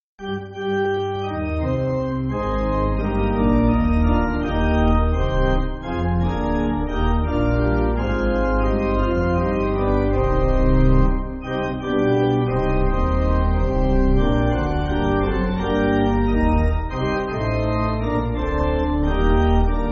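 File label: Pipe Organ, Backing Files for Churches; You Are Great God; Lajos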